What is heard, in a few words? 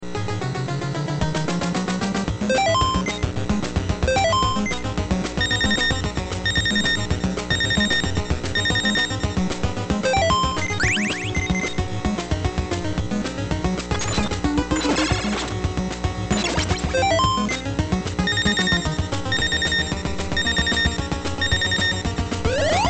hp alarm; tmnt